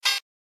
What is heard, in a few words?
Low Battery